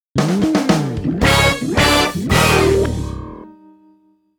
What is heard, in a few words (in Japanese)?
ジングル; Futamata Renai